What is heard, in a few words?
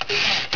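typewriter return; HAUSHALT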